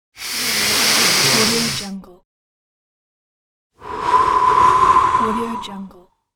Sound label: yoga inhale exhale